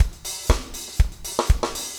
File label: 4 on floor 120, loops, 120bpm